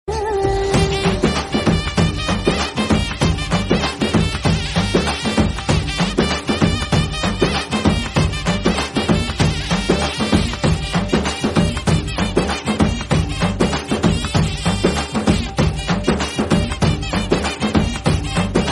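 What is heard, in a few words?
song background music ringtone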